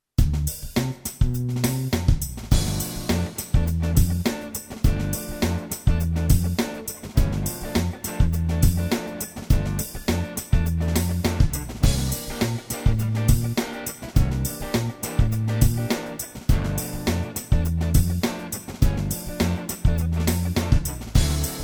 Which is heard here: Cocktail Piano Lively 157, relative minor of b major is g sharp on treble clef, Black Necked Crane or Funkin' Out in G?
Funkin' Out in G